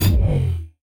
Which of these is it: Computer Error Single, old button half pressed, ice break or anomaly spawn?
Computer Error Single